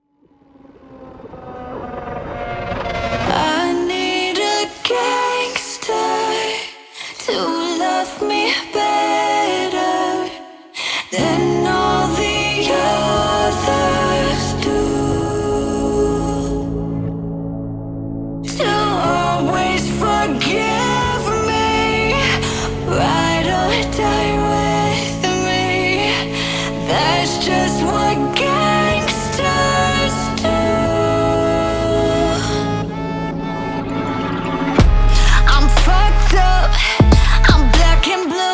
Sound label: (Rock Cover)